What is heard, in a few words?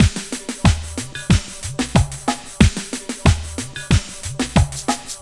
LOOP, Drum Loops; Big Fish Audio; Prosonus 500 Drum Loops